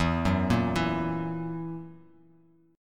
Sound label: E Suspended 2nd Flat 5th E down Bass Standard; arpeggiated; JGuitar